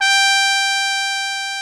trumpet G4; Greytsounds VOL-1

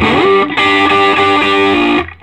SOLO G, Vintage Blues Guitar

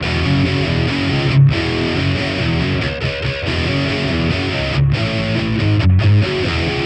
Distorted Guitar 140BPM F#min; Distorted Guitars; Vengeance - Essential Clubsounds Vol.4; Guitarloops

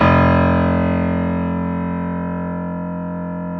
GRAND PF

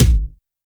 kick, Roland R-70, BD

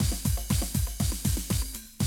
Classic Drum Loops

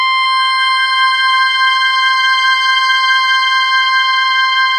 SAXY C5

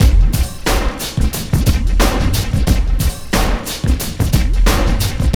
TRIP 90, trip loop

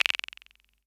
SR95; Best Service; Dance Mega Drums

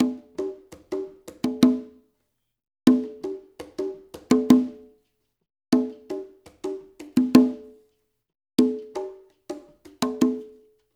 CONGAS L; Spectrasonics; Liquid Grooves 1 & 2; SAUNTER

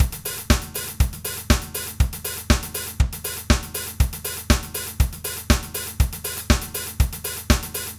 Basic Acoustic Drums, drumloops; Dr. Fink's Funk Factory

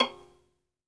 SOLO VI, Synclavier Strings; ILIO

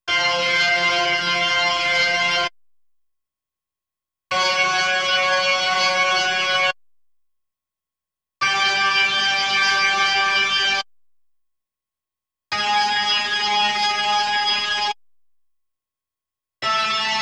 B3 Organ; Masterbits CLIMAX 8

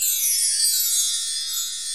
TREE BELLS; Crypton - DrumBase5000; COOL PERCS

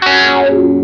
WAH DOWN, Funk Gtr & Wah; PlatinumPhattCD2; E-MU Producer Series Vol. 8 – Platinum Phatt

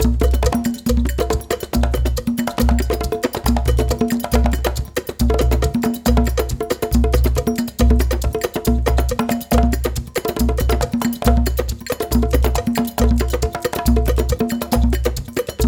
138, Lead Drm, Gyana HiLife; Spectrasonics; Supreme Beats

